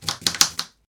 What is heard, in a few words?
chopping